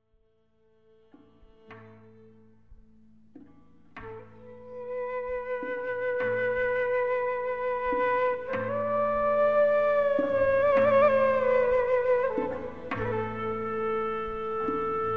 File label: NAY, Cho`li Iroq